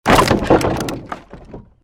created wooden barrier smash medium

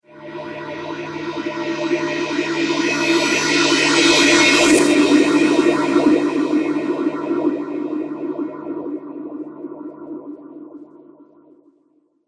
Reverse Cymbal Phaser

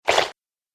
App Game - Sticky Splat

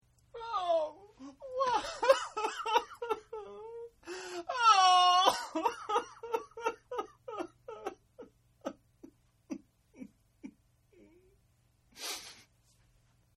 Crying Male; Voice

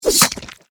Sword, Impact, Human, Guts, Squish; Warfare; Sword Sound Effects; Combat; SoundEffects-BlastWave

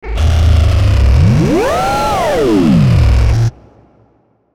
Static Hum Feedback Sweep

